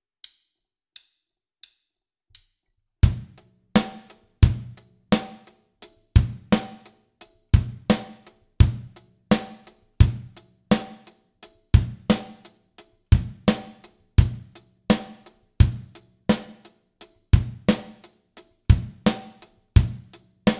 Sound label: Grooves; Bum Bum Tschack 1